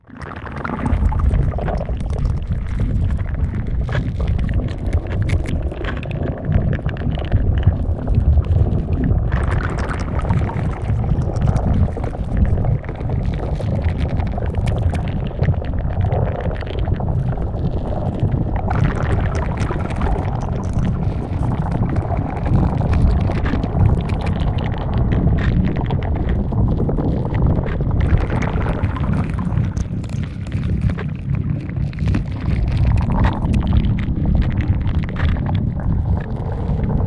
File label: underwater designed lava move huge medium speed; sonniss gdc